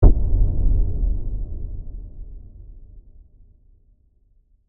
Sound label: Hit Reverb Dark; Hit Sound Effects; Sound Design